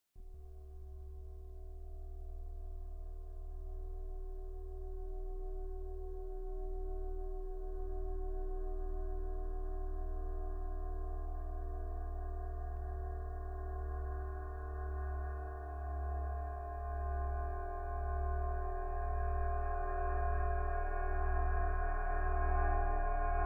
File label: flying cymbal; flying mic technique; ominous cymbals